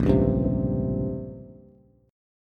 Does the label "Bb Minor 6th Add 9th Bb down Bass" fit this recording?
Yes